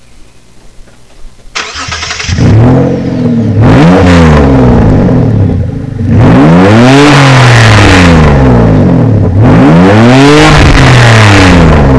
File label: stealth exhaust cat only; garage; mods